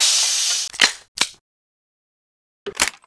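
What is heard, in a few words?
grenade reload, grenlauncher; Dystopia; weapons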